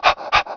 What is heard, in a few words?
Dog Pant; Playable Tutorial Level; TR2